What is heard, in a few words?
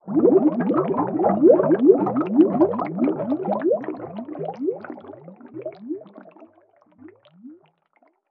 Underwater Water Object Sink Submerge Bubbles Gurgle